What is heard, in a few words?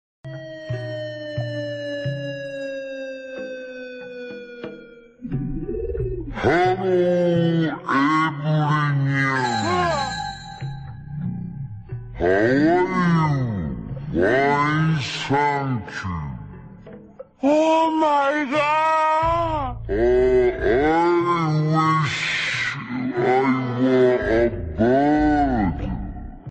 bird